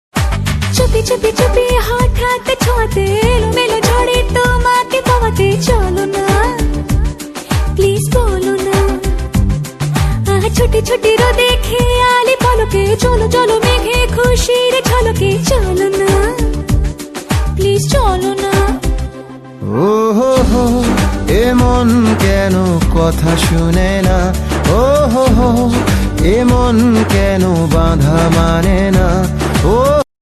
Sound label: bengali ringtone